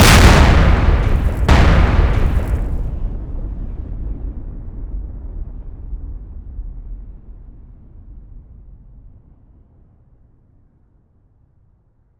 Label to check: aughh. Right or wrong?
Wrong